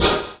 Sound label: Vinyl Fx; Sonic Foundry (Sony Creative Software); Esoterik Beatz; Vinyl101